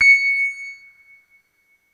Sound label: soft tines Cs6